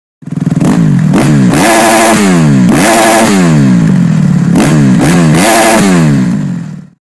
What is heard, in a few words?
Motocross rev